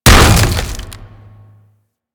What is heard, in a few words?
Hammer Impact Wood D; Warframe; Weapons